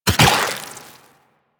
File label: Shot; Warframe; Weapons; Moa Pet; Cryotra